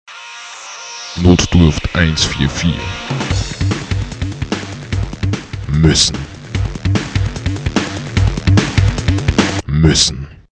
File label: jingle electro